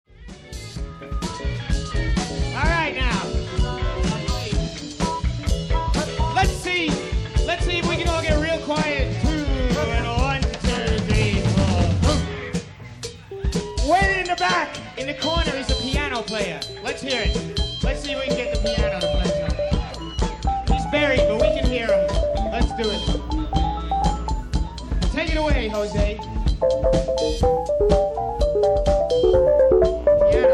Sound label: Strange Paper Bag Solos